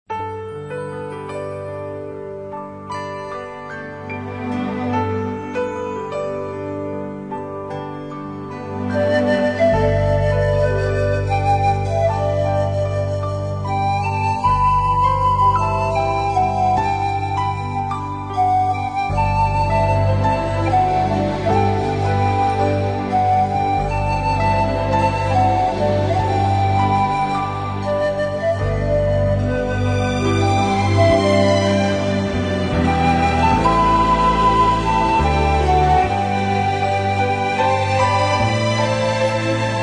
Orchestra Panpipes, Rock of Ages (Medley)

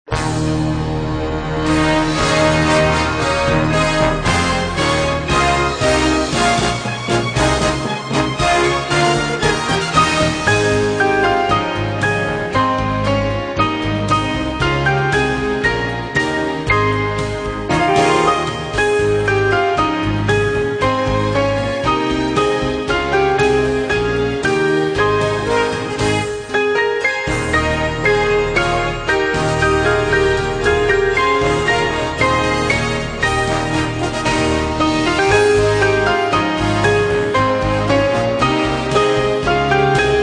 Instrumental Hymns

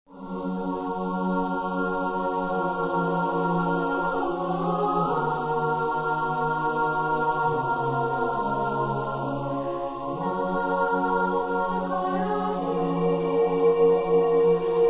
Singers And Orchestra

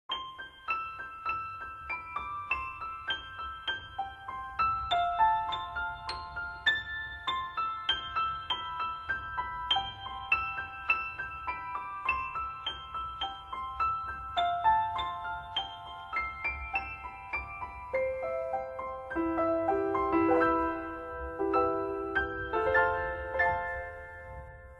jesus loves me medley piano solos

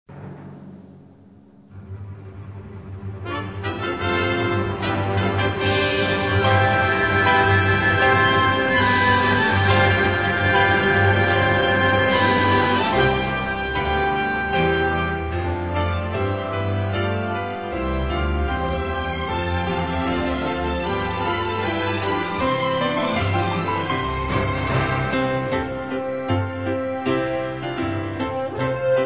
Strings, Christmas

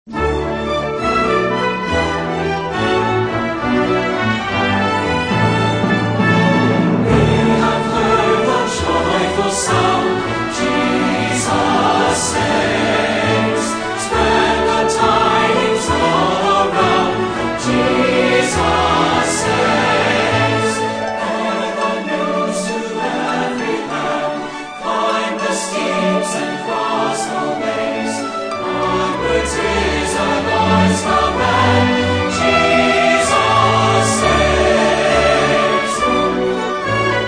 Singers And Orchestra

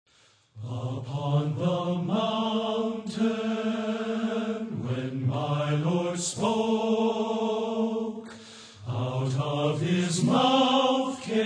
Men's Choir

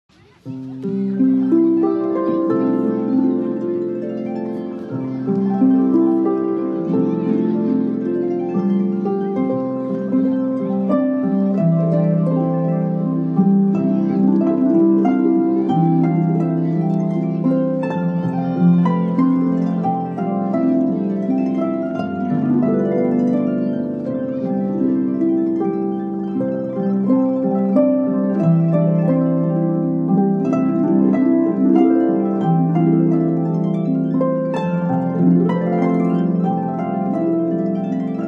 Harp

